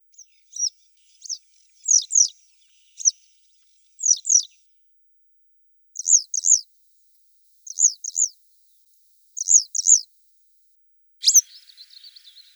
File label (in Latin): Motacilla flava